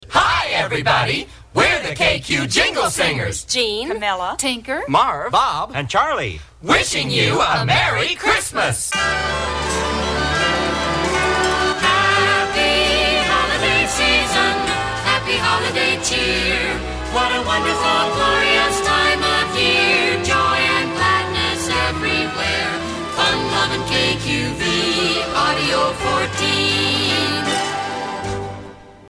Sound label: Jingle Happy Holiday Season